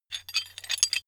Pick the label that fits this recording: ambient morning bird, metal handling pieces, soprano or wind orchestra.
metal handling pieces